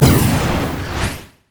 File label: item scroll of teleport return; LoL